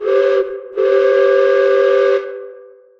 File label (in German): dampflokpfeife 2 mal; salamisound